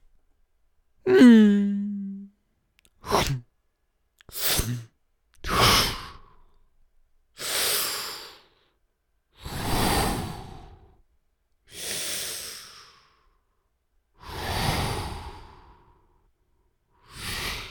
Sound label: A Wide Variety Of Wooshes Made By A Human